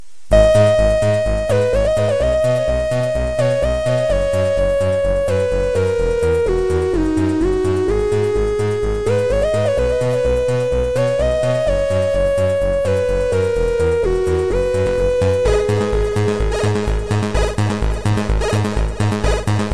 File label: Keygen